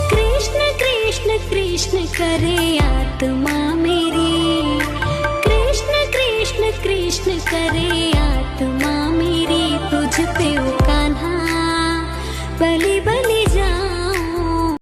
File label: Hey Gopal Krishna Karu Aarti Teri; devotional ringtones